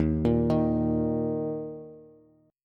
Eb 5th, Bass; 11,13,13,x; JGuitar

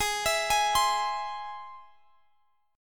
Ab Augmented Ab, Guitar, Half Step Down

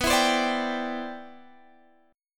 C Major Add 9th, B, guitar chord

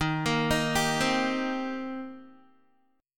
Eb 6th Eb down Guitar; arpeggiated

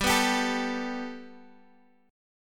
G Major Add 9th; Gadd9 strummed

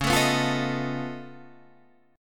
Db Augmented 9th Db down Guitar Half Step Down

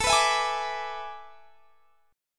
A Major 7th Suspended 2nd Suspended 4th; AM7sus2sus4; strummed; x,24,21,21,21,22

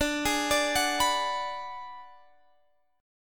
Ab Minor 7th Flat 5th D

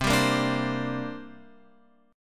C 7th Suspended 2nd, Guitar, Open F; C7sus2 strummed; JGuitar

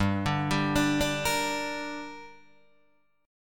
G Minor G, Guitar, DADGAD

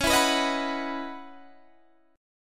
Db Minor 11th Db down Guitar Standard; strummed

